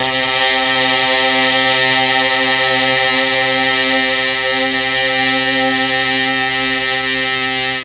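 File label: C2 strings brass, mellotron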